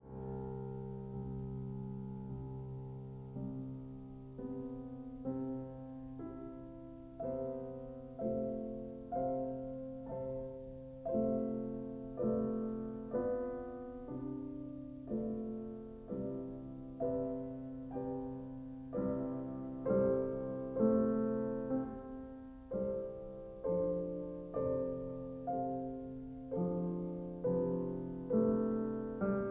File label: Piano Sonata second mvt coda; Brahms; Piano Sonata 1